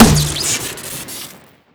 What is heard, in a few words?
grenade launcher shoot crit; tf2classic